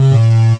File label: puzzle fail; ragemap2019; giegue